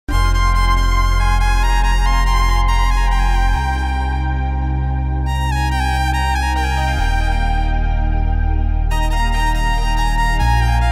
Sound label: violin cover skrzypce electric violin